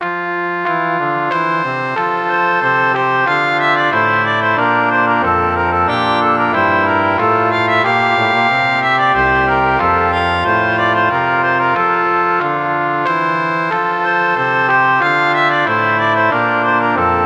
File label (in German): lobe den herren, orgel